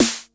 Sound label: 909 SNAPPY, TR-909 DRUMS